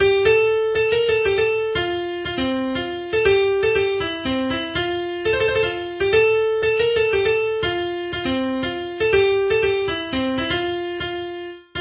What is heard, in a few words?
Polska; Polska Fran Lovstabruk